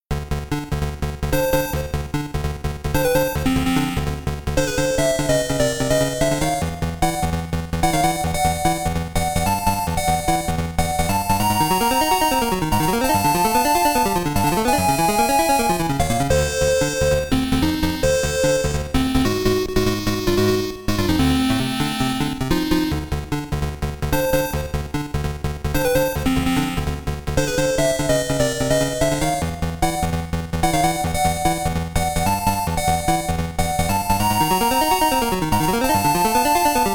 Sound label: Beat Bank BGM; Second Stage - Stonehenge BGM